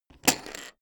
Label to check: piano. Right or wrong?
Wrong